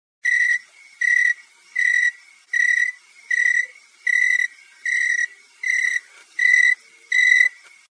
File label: Crickets Concerto